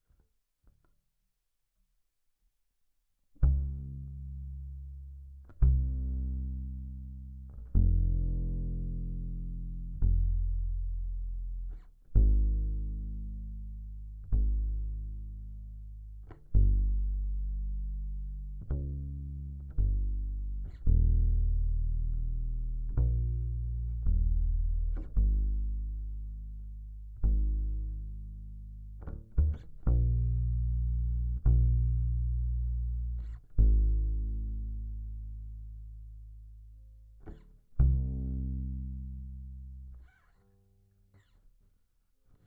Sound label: Bass DI